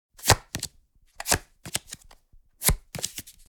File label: Flipping through cards board game